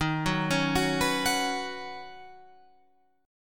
D sharp Minor 7th Sharp 5th D sharp, down, Guitar, Open F; D#m7#5 arpeggiated